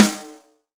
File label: snare, Roland R-70